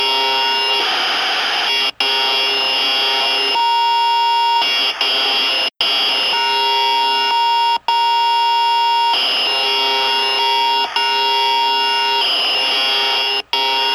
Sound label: crazy garble; five nights at freddy s fnaf 3